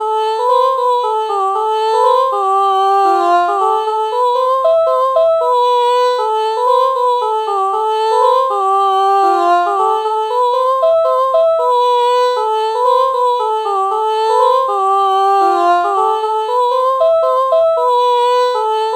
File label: ah ah melody morph